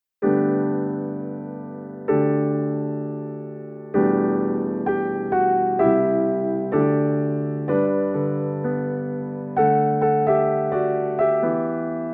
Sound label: harmonized with lead